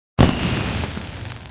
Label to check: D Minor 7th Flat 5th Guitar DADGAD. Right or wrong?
Wrong